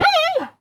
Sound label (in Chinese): 熊猫受伤声